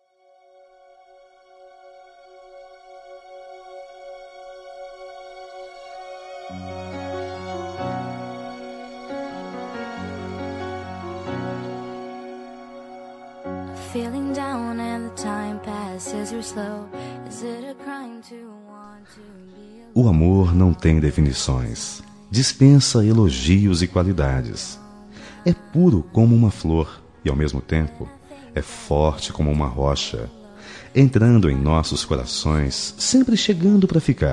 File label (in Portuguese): Romantica Masculino; Romantica Para Casal Masculino